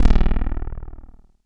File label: JUNO BASS